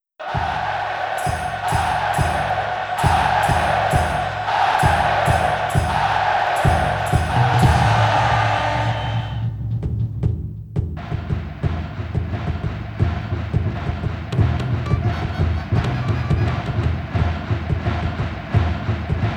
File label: Spectator Chant; Heat of Eleven 98; arcade